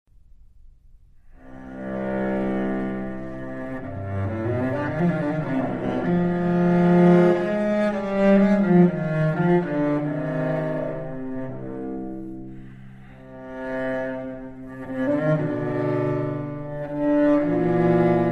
Cello Prelude C Minor; Bach; 6 cello suites; Cello Suite No5; BWV1011

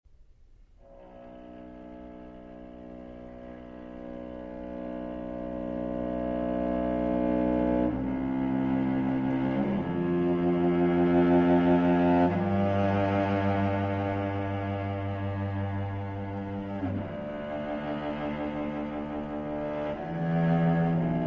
Sonata For Solo Cello Adagio; Kodaly

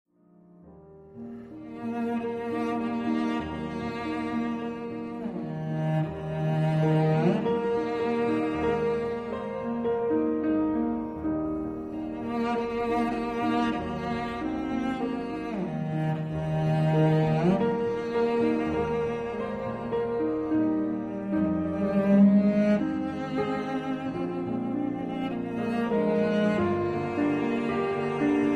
Cello Sonata In G Minor Andante; Rachmaninov; Op 19 3

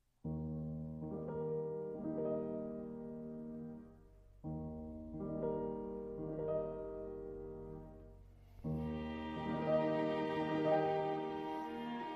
Adagio In E Flat Major D897 Notturno

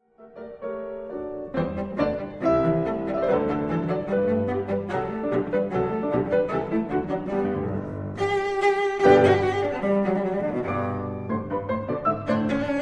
Cello Sonata In G Minor Rondo; Beethoven; Cello Sonata 2; Op 5 2

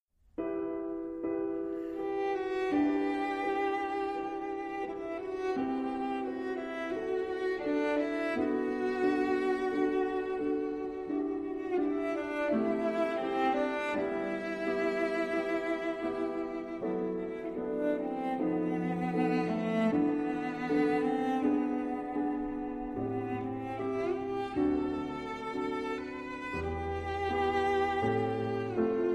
Vocalise; Rachmaninoff

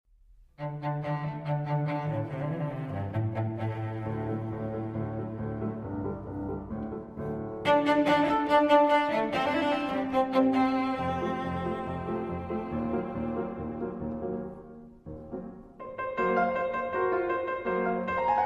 Cello Sonata Allegro Con Spirito; Kodaly; Op 4 2

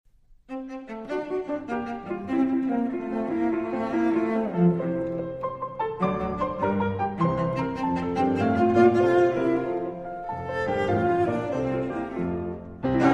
Cello Sonata 1 In F Op 5 2 Rondo; Beethoven

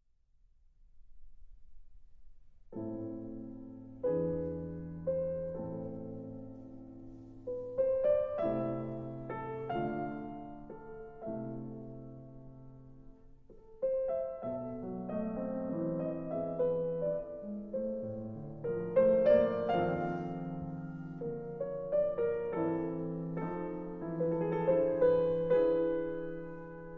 Sonata for Arpeggione in A minor Allegro Moderato

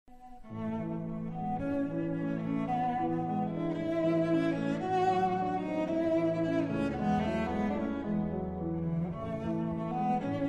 Sonata for Arpeggione in A minor Allegretto

